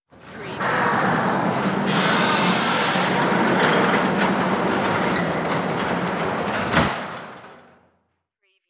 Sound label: Warehouse Door Close; Sound Effects Bible